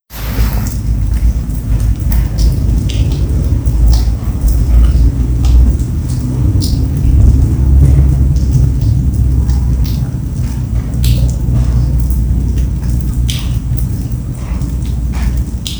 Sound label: snow melting; sheki